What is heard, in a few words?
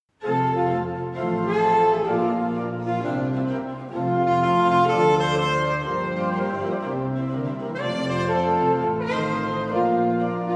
Swing low, solo sax; musik